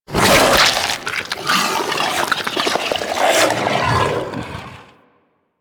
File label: Tendrils Pull B; Warframe; Warframes